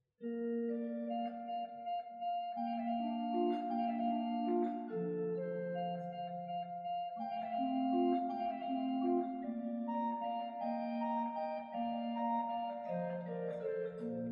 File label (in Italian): Flauto Traverso